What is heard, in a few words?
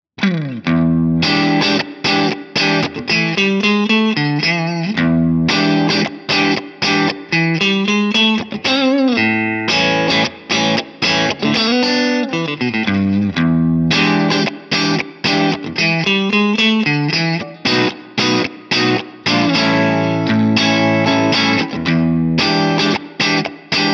breakup; jensentone